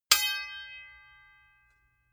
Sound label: sword hit sweetener